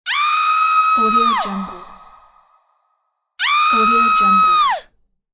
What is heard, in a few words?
scream